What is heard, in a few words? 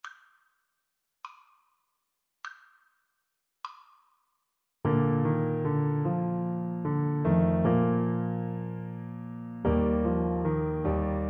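eye is on the sparrow, alto saxophone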